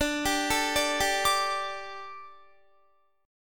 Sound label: D Suspended 4th D, down, Guitar; Dsus4; arpeggiated